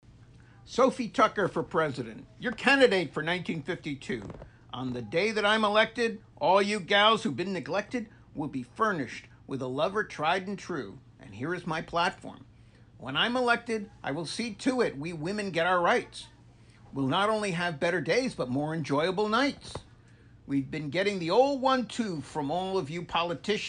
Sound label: Laugh Track; Lauren Sklaroff; Joke